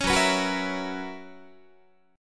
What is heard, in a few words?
F sharp 11th F sharp Guitar; F#11; strummed